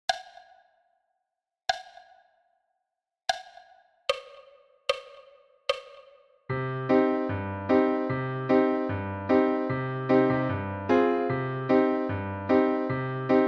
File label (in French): piano a 75 bpm